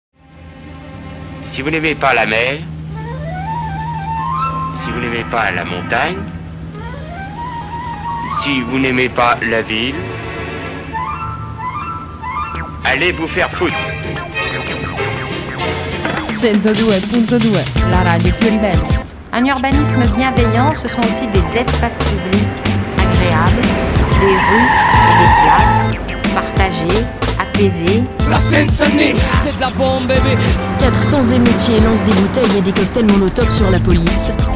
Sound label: jingle